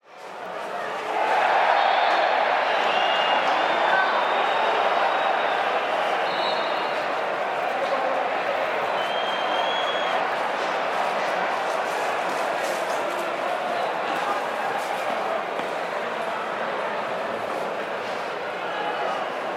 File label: Soccer Game Football Crowd Ambience Upset Chanting Walla; Stringer Sound